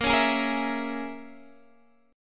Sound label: A sharp Minor 7th Flat 5th A sharp; A#m7b5; strummed; x,13,11,13,11,12